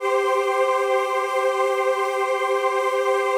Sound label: digi pad, Pads